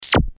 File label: syntetic zap